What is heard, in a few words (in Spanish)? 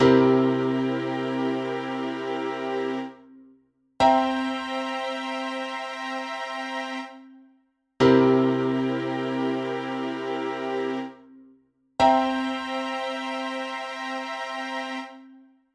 acorde septima dominante